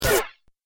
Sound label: Robot Hit By Laser; Robot Sound Effects; Sci-Fi Robot Sfx; Andrew Fleming